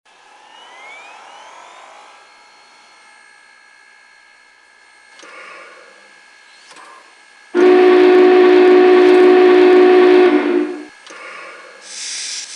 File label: Big Boy